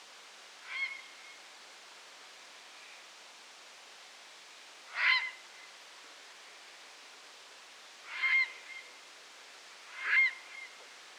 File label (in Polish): czapla siwa Ardea cinerea